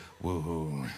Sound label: Woo Hoo